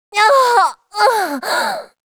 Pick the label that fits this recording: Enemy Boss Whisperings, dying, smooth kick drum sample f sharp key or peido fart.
dying